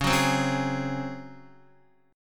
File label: C sharp Major 7th Suspended 4th C sharp Guitar